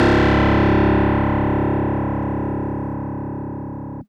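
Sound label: Fuzz Clav DX100 C#1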